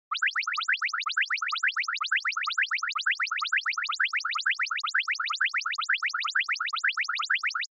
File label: Panic Fast; Onoma